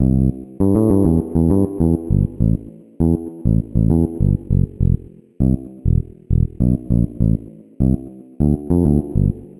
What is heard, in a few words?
Funkbass; Even the Ocean